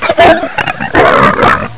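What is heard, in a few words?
alien grunt alert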